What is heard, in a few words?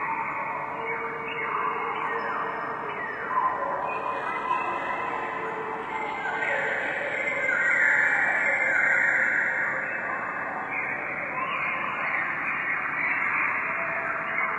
birds, ambient lair; video games; Source Engine; tf